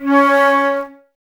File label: flute, Wood Winds, Flutes; Miroslav Vitous - Woodwind Brass Ensemble